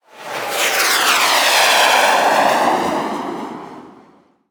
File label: Missile Fly By; Weapons; 1001 Sound Effects